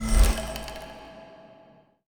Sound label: logo rolloff, ui; dota